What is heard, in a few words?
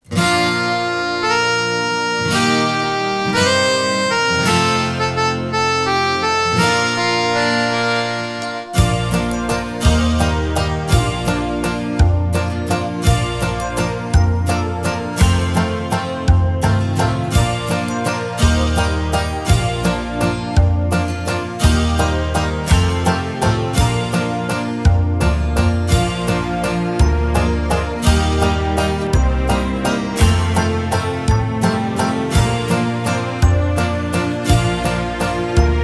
My Bonnie is over the ocean To play along with, recorder